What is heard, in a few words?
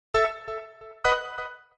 Sfx Basketball